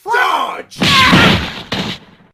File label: piccolo